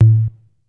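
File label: tom, Casio CTK-5000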